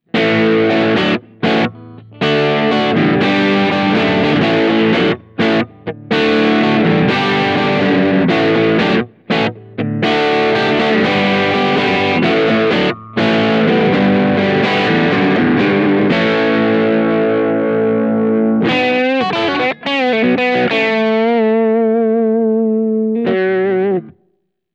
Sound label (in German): Schecter C 1 Exotic Beide Humbucker Breakup